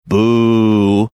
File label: booing